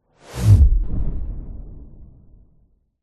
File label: science fiction missile whoosh pass by hit